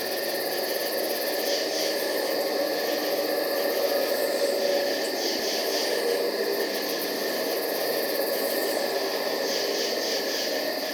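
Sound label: forest ambience; frightening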